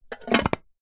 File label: tin small empty metal lid on close